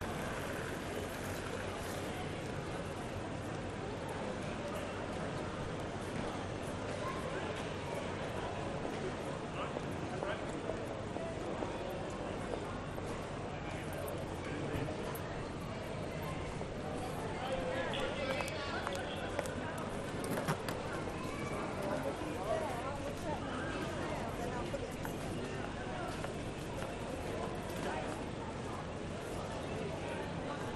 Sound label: piccadilly train station ambience crowd manchester uk